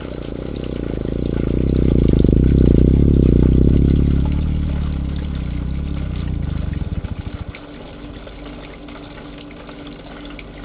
Melanogrammus aeglefinus Hum

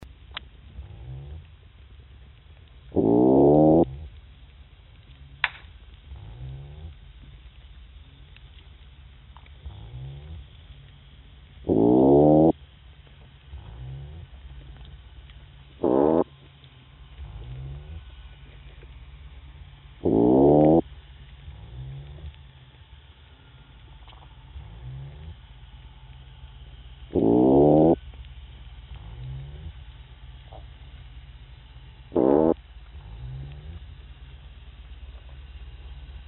Halobatrachus didactylus boatwhistle

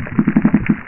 Glaucosoma hebraicum Knock

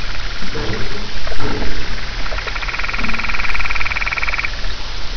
Ophidion marginatum Chorus, fish; Soniferous Fishes of Massachusetts

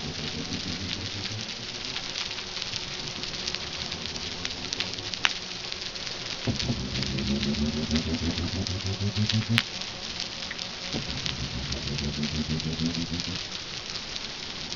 Unknown sp Single Call

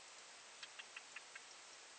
Anoplopoma fimbria Rasp Tick Short

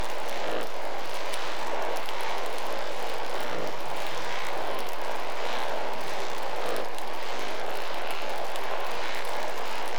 Unknown sp4 Chorus, fish

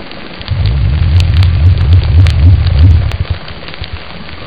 Mycteroperca bonaci

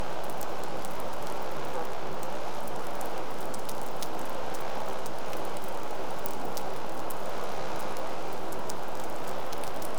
Chorus, fish; Sanjiao

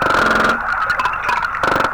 Ophidion marginatum Jackhammer; Soniferous Fishes of Massachusetts

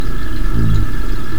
Boreogadus saida Grunt; Polar cod

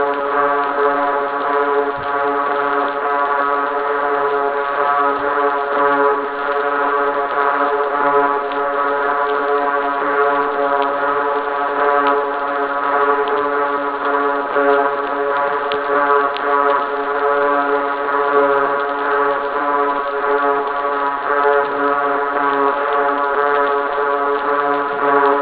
Unknown sp Chorus, fish

